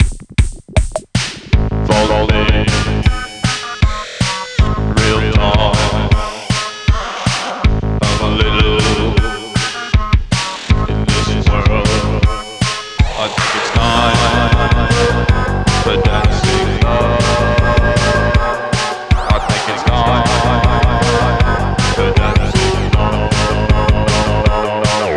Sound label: dancing low